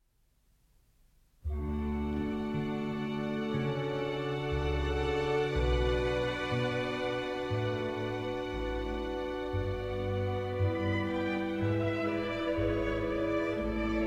Orchestral Suite No. 3 in D Major, Air

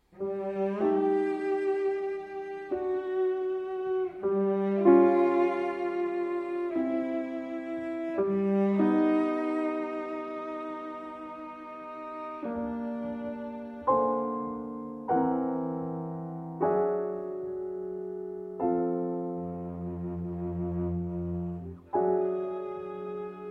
Sonata for Cello and Piano I. Andante

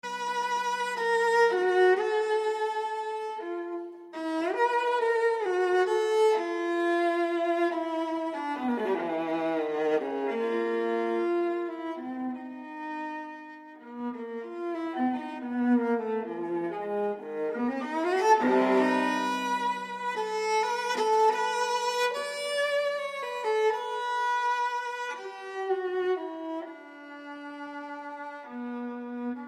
Unaccompanied Viola, Prelude

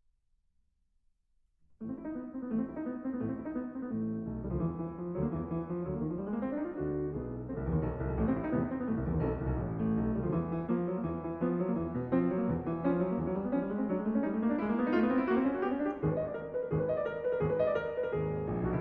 Piano Sonata in A major, Vivace

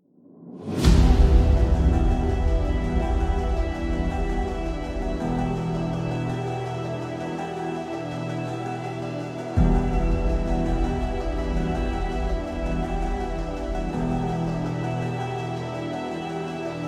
Instrumental

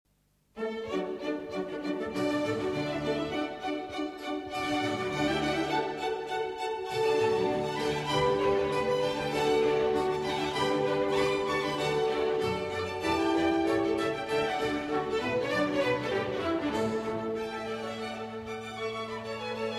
Trumpet Concerto in D Major, Allegro moderato; Mozart, L.

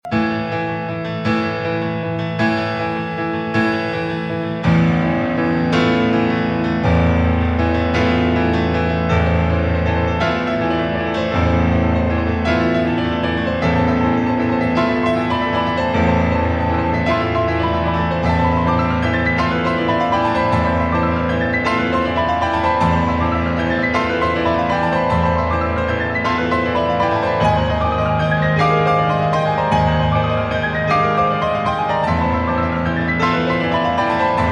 Piano Duet; X. She Took the Path

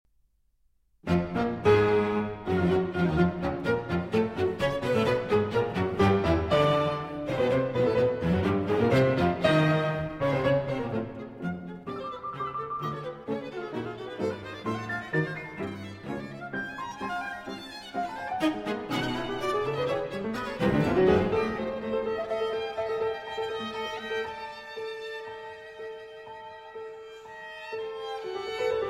Piano Quartet, Duett für Vier

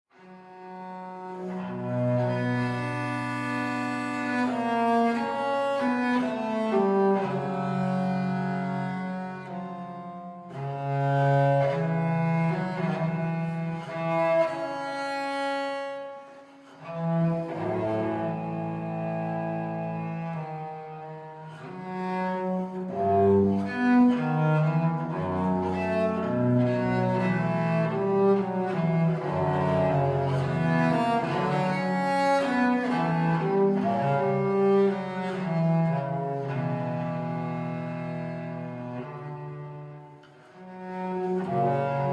Viola da Gamba, Adagio; Essercizii per la Viola da Gamba No. 5

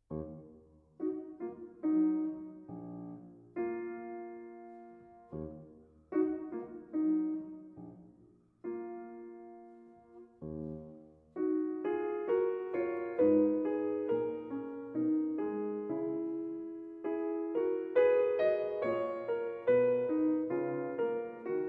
Fantasia in E-Flat Minor For Piano

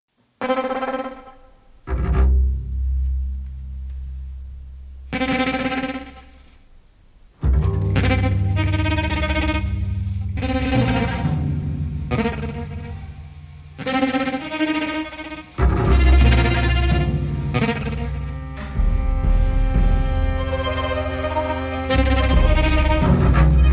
Violin Study

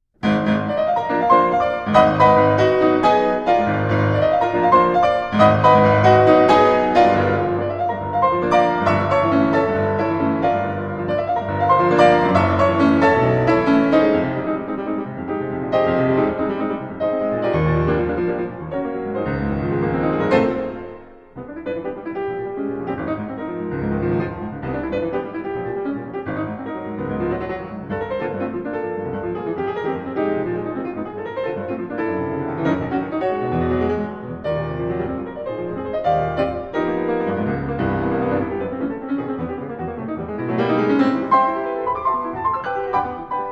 Concert Etude, Prelude Allegro Assai; Eight Concert Etudes, Op. 40 I.

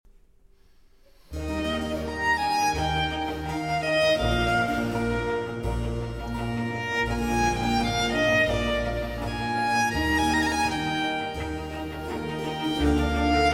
Violin Concerto in F Minor Largo

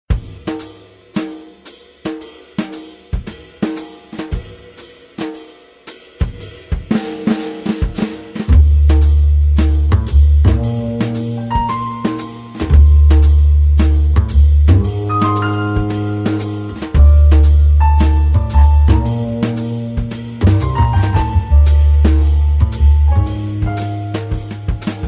Jazz Trio

